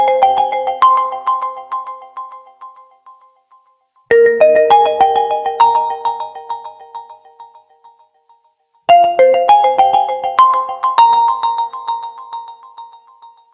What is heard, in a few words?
Lakeside Ringtone; Oppo; Reno 2